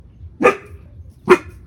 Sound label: dog Bark